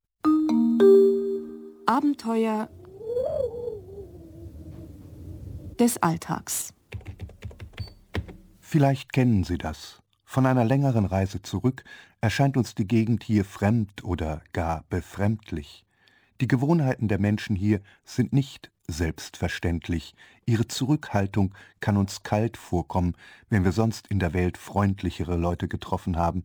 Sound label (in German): akustisches foyer; Abenteuer des Alltags